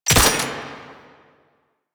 Belt Fed Rifle Low Clip; Weapons; Warframe; Tenno; Frumentarius

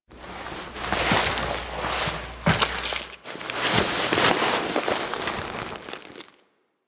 Alchemy Lab Chair Foley Sit; Warframe; Hub; Liset Alchemy Lab

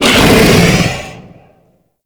Infested Bone Blade Vocal Mortar B; Warframe

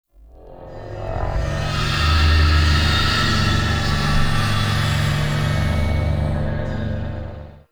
Exterior Jealous Sky Screech

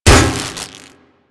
Electro Prod Hit; Warframe; Weapons

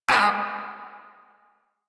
Pain Lich D; Kuva Lich

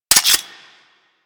Grenade Launcher Mech; Grineer

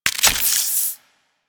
Modular Primary Handle Reload, Guns; SU Modular Guns; Reloads

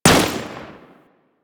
Fire, Rifle; Deth Cube Prime Fire; Warframe; Weapons; Sentinel; Deth Machine Rifle

